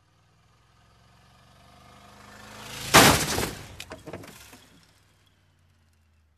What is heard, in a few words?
Motorcycle Crash